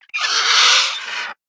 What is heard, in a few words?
Whelp Death